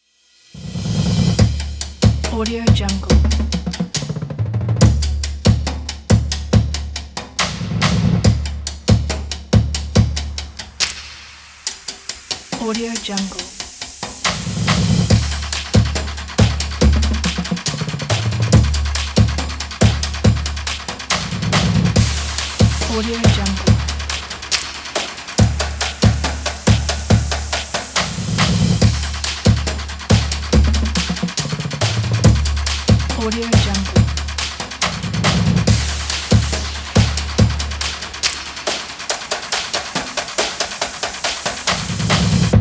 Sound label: hiphop drumline percussion